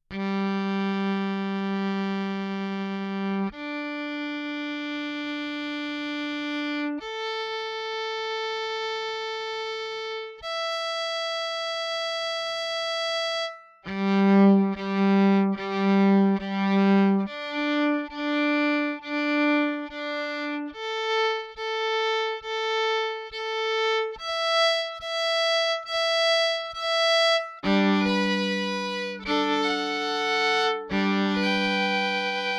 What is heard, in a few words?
Violin Pickup Baggs Treble